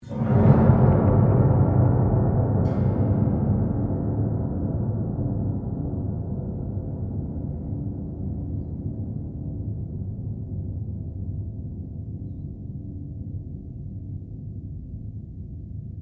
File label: PIANO UPRIGHT BROKEN STRUM UP, Upright piano without keyboard; Pianos (Sound Effects:Musical)